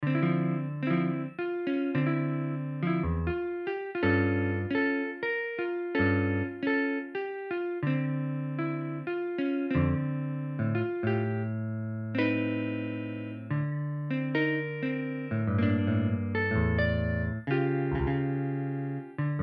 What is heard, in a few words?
synth